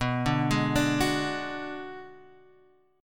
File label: Ab Minor 7th Flat 5th B